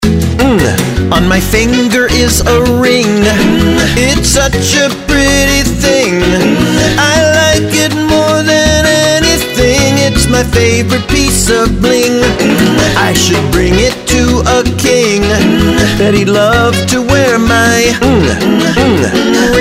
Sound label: Song Ring